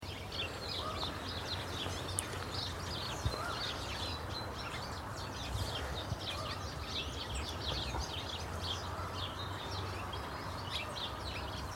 birds twittering at kimmeridge bay dorset

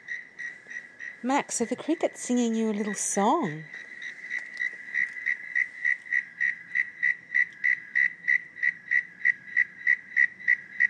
cicada

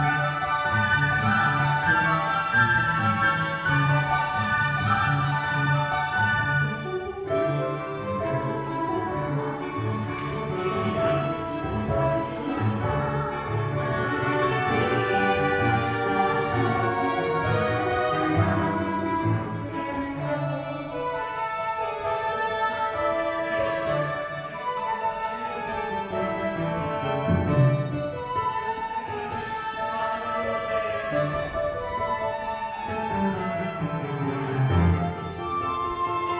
band call

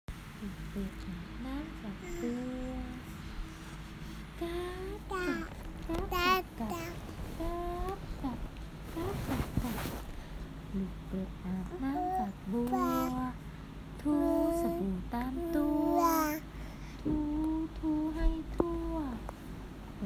goodnight singing 14 months

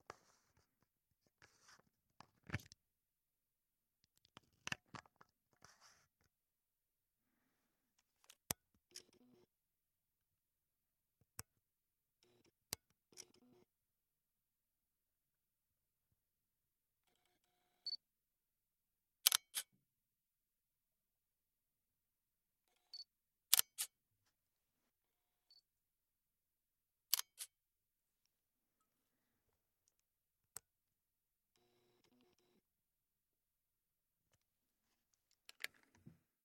camera Lumix GF2 on off shutter noise

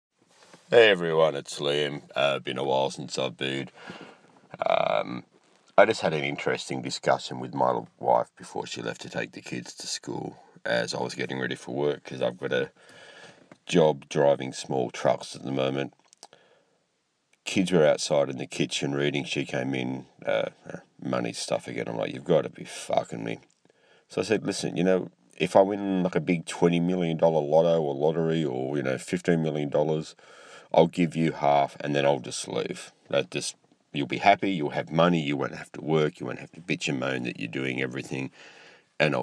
aaaaargh

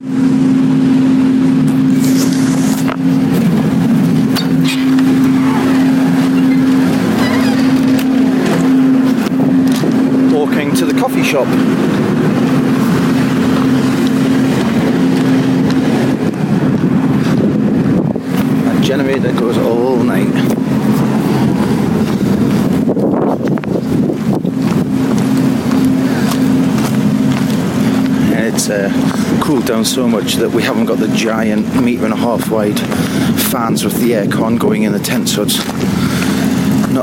walking to the coffee shop soundscape

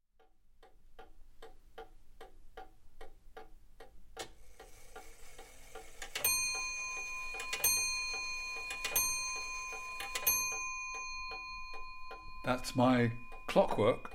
silence with clock

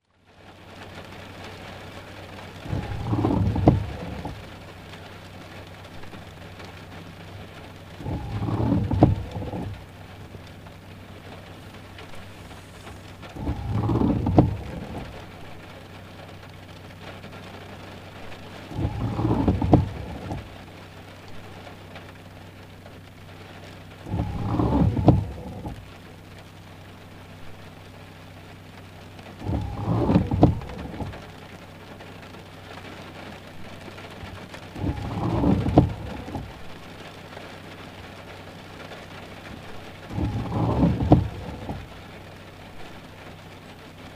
windscreen wipers

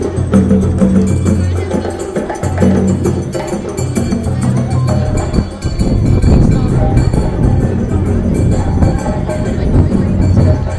drums; warding off the recession at the central bank

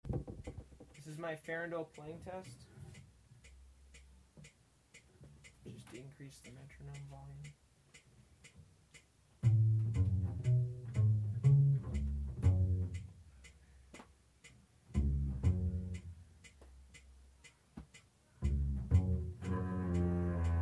farandole playing test

